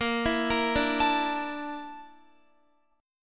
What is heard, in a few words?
Bb Major 7th Flat 5th, Guitar; arpeggiated